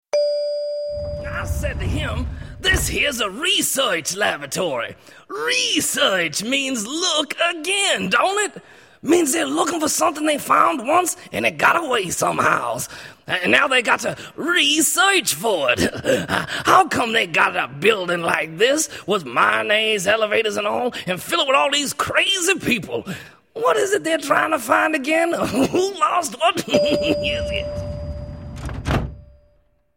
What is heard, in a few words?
Elevator